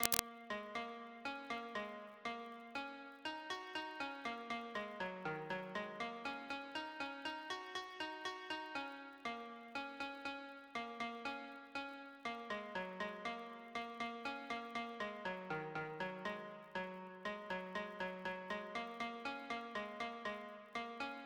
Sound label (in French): pentatonique